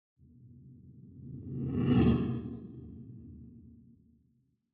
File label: scifi fly by